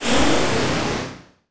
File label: Jingle Encount; Monster Racers; ds